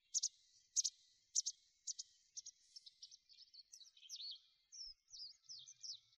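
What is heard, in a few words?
hakusekirei call, white wagtail; jinaki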